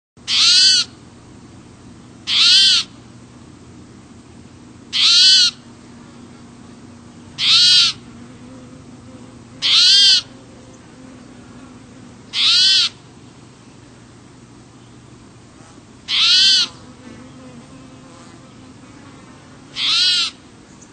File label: Spotted Towhee; Birds